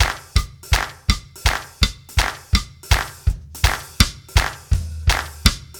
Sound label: backbeat jazz clap